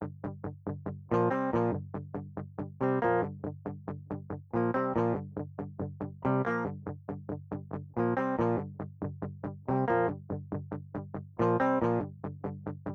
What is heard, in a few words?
git r di